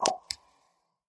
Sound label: flip